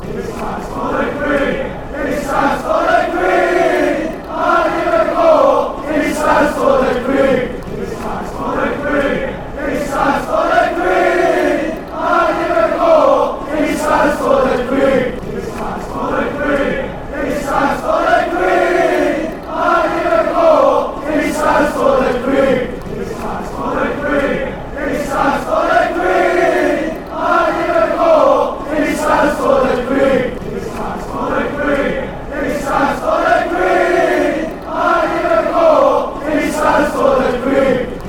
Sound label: he stands for the queen, fanchants